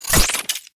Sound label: shears